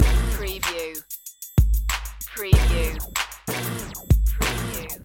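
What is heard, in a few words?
Scratch Hits 95 BT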